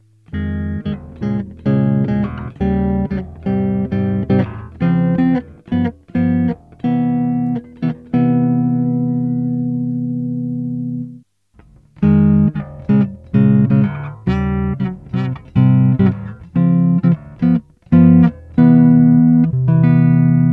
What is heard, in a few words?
yamaha bb std chords